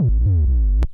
d kikk

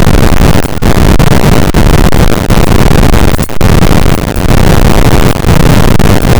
noise sync

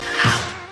aho

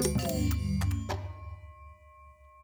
folk

